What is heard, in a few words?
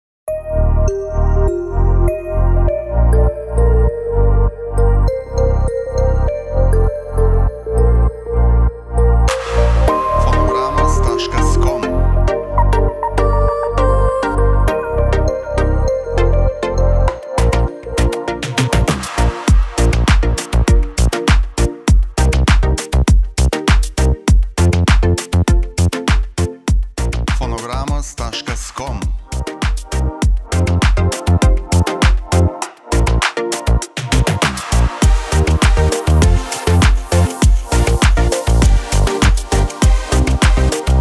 karaoke